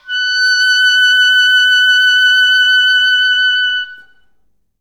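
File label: vibrato F#5; Best Service; Peter Siedlaczek's Advanced Orchestra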